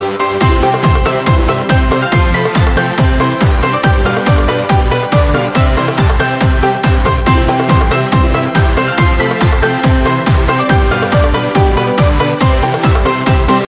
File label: Music Box